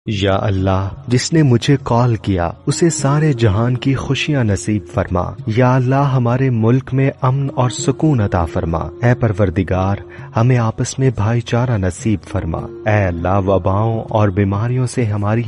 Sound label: islamic dua male version